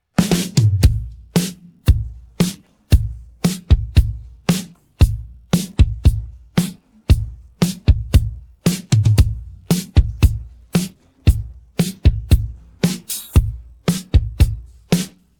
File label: pattern, drums